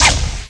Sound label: sun Disc Thrower Impact; NETSTORM